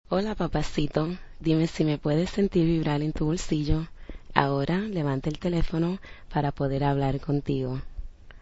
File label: spanish ringtone